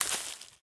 Foot Weeds Shoes Walk

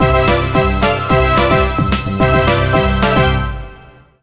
Jingle, level complete; My Frogger Toy Trials; Nintendo DS

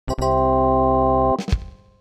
Cannonball - Hit the Target

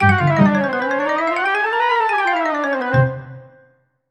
Stinger

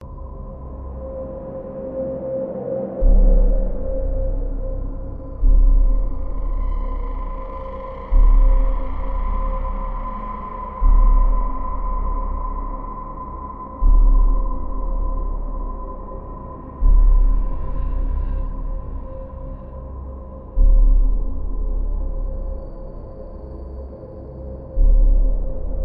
Pad

